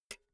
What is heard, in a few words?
pull out jack cable quick; objekte; elektronik